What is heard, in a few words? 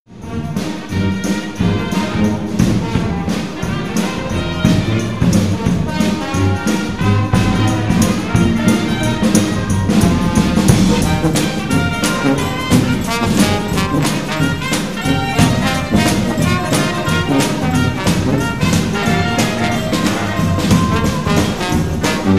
Silver Leaf Brass Band